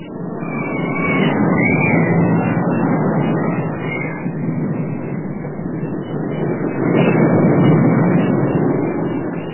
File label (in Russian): kriki lyudej s gorok